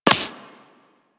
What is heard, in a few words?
Laser Impact Flesh; Warframe; Weapons